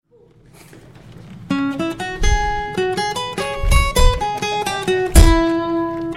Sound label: Rock Guitarist